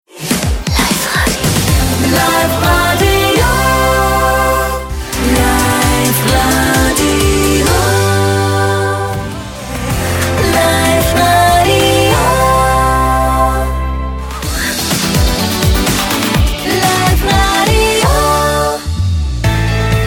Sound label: Singer, Station Tags; Life Radio